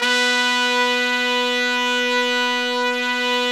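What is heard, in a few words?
brass section; Zero-G; Phantom Horns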